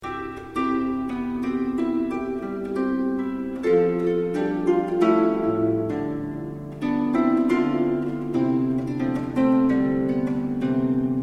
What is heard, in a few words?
arpa